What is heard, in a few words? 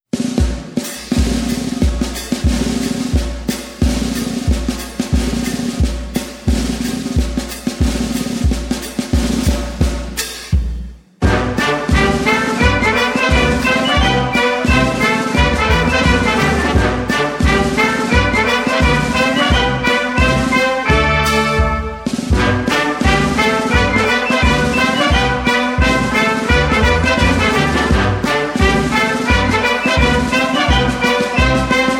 Funk